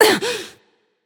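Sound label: grunt hit, female; smite; voicepack; hera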